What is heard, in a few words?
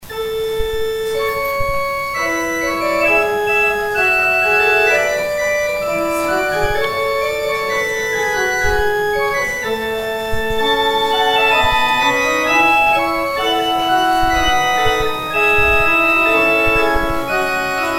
organ interlude; Offering organ interlude